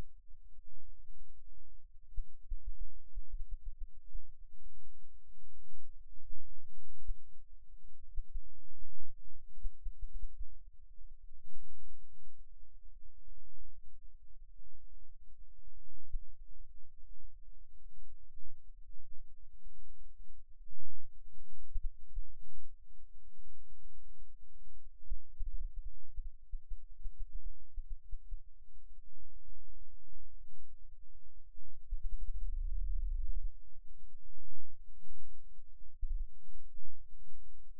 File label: Rhythm Wave, eeg seizures